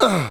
VOX SHORTS; Megabass Remix! 2

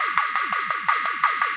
drums, Hardcore Breakbeat 152 Dmin; Big Fish Audio; Hardcore Breakbeat Action; Gone Insane

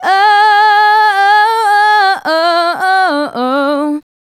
Female Solo, Vocal

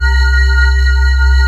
SPACE PAD C3; Q Up Arts; Dream Experience

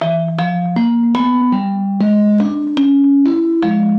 Dirt Vibe, 120bpm, E

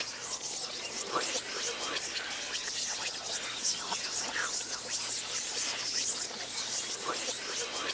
WHISP REV, whispers

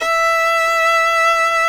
FIDDLE NOTES, E5, L

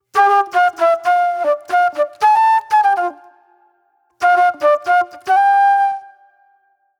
Sweet Soul Flute

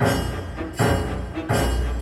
Orchestral Loops; 128 STRAVNSKY

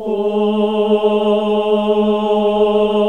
"Ooh" G#1, classic vox

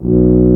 Tuba mf B0; Digital Sound Factory Virtuoso

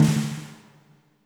FAT SNR L, WARM BALLAD; Spectrasonics; Burning Grooves CD2

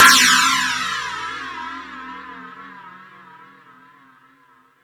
Crash 2, drum machine

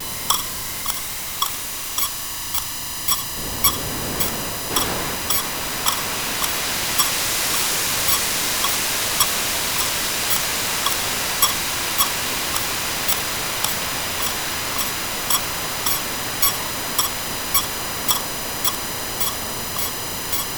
BEE; ENVIRN-AMB

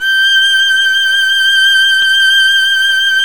STR VL G, SOLO SECTION; Roland - LA Composer vol. 1

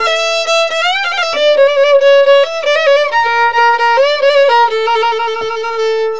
VIOLIN; USB Soundscan vol.63; AKAI

